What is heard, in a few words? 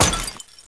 PORCELAIN IMPACT